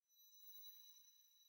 Env Field Day Ground; the legend of zelda tears of the kingdom

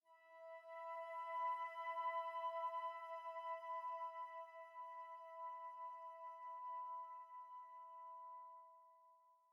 Env Field Day Ground

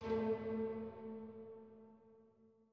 chime old; chime sharp

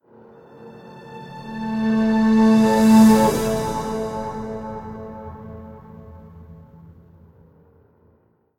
Glider Start; Chapter2 Birdsnest; Brothers: A Tale of Two Sons

